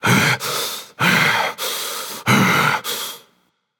Breath; smite; anubis

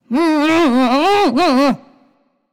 grumble; smite; voicepack; nuwa

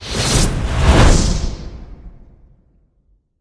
landing; Smite; Zhong Kui

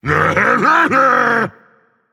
Tongue, Dragon; fafnir

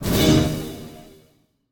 pwr sm fire; smite; god baronsamedi

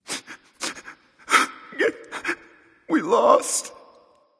boohoo; smite

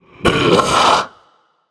ugh; smite; hades

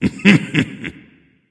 heh heh; smite; ahpuch

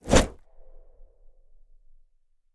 swing; odin; gugnir; smite

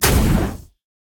projectile; smite; god raijin

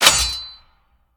sword block; smite; god arthur

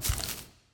phoenix step; smite; npc

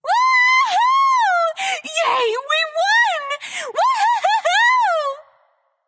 "yay"; smite; nuwa

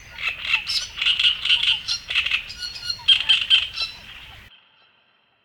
ambience bad birds; smite; conquest

